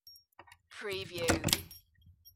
Insert Key and Unlock Door

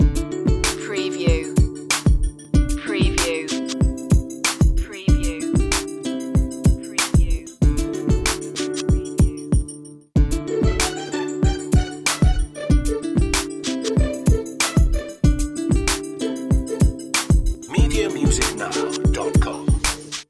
fond memories loop

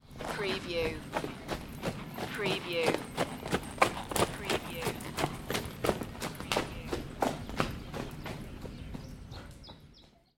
Horse trotting by

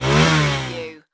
Motorcycle Dirt Bike Rev

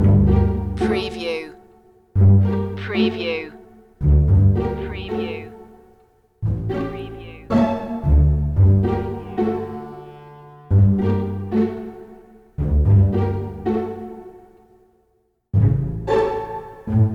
pondering loop

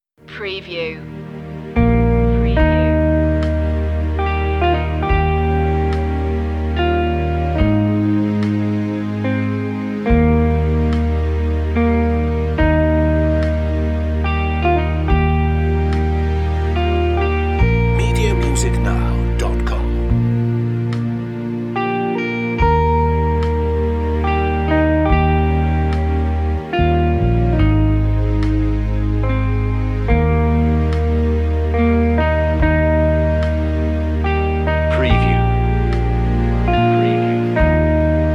amazing grace regular verse 72bpm

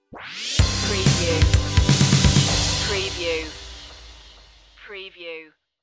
horizon stinger

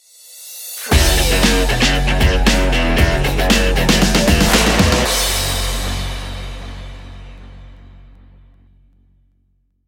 boots of fire stinger

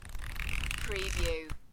paper fanning book

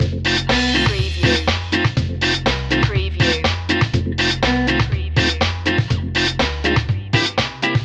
reggae reverie loop

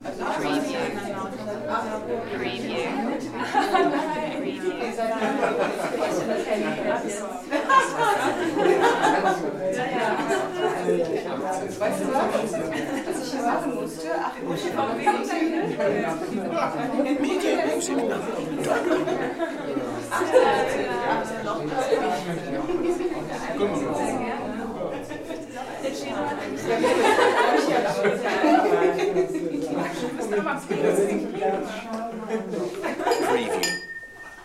champagne reception

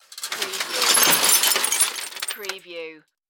Debris Plastic Glass

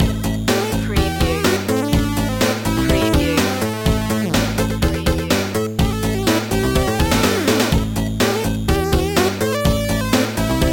retro video game hotwired loop

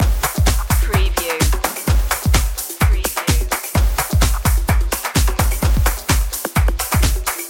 action energy drums loop; Royalty free music with tribal influences ideal for creating a rainforest or jungle tribe

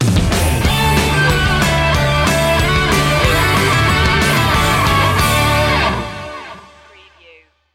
deck the halls green remix sting

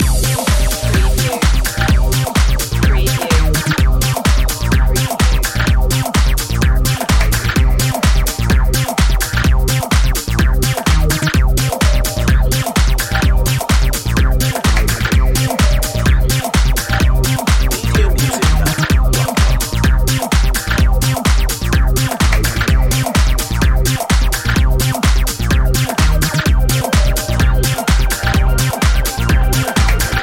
beat loop

